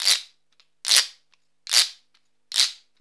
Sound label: RASP; DRUMS & PERC